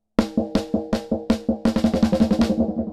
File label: Delay RESONANCE 5